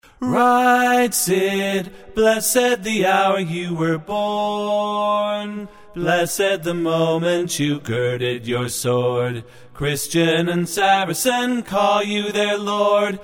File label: Tenor Harmony